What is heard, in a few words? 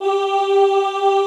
OOH G3, Classical Choir; Best Service; Extended Classical Choir